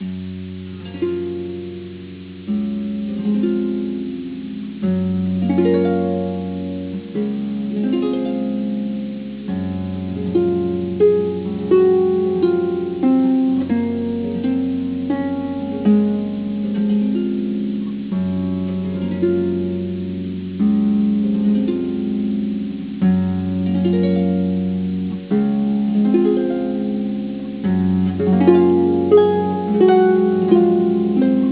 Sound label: harp